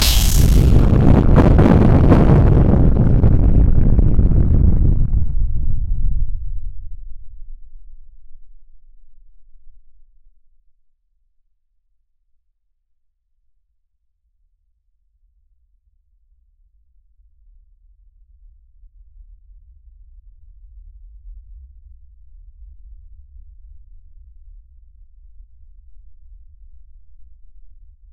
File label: a huge meteorite enters t, atmospheric entry